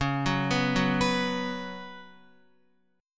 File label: Db 7th Suspended 4th Db down Guitar; JGuitar